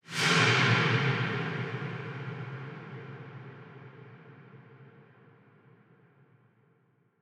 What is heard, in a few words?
Deep Swoosh